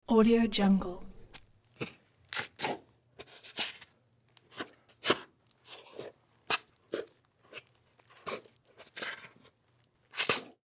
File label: wet bubbling suction in thick liquid; hollywoodedge